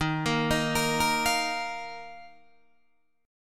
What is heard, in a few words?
D sharp Suspended 2nd D sharp, Guitar Open D; chord shape